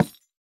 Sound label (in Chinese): 樱花木 悬挂 告示牌 破坏声